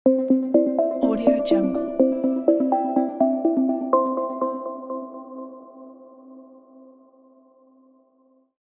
short hitech logo

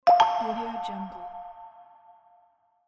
new message confirm

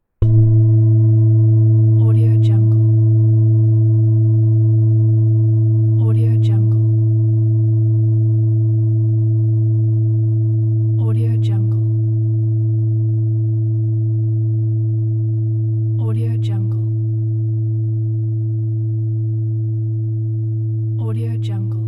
singing bowl 10 inch

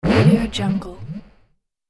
massive blip; astralaudio

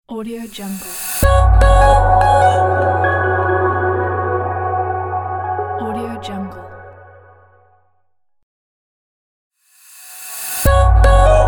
intro outro logo

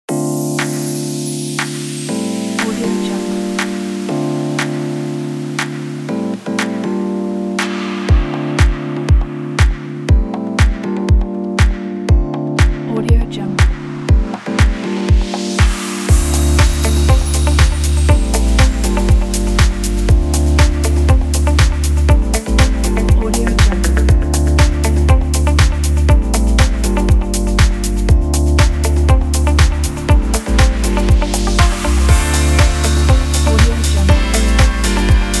fashion house

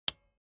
transportation bicycle street brake release

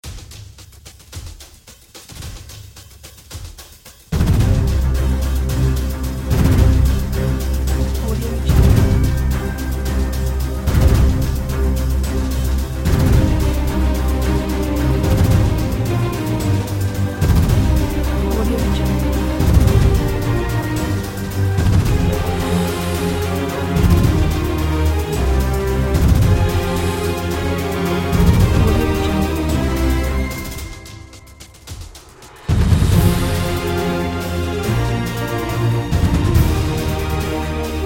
triumph flight

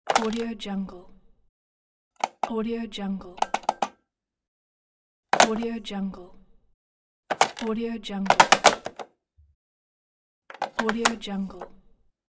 telephone

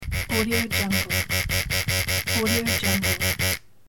cell phone vibrate

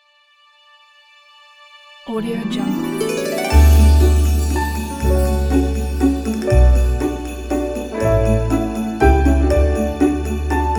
christmas waltz

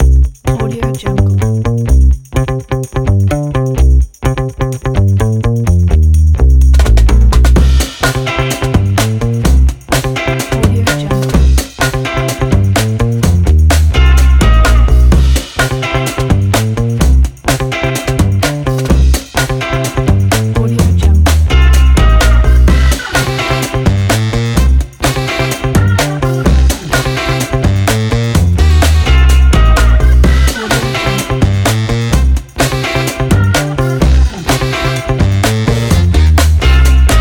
retro and funky

